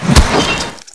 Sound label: strike